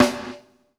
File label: HIT VERB, PROC SNRS, Drumworks; Sampleheads; New York City Drumworks VOL-1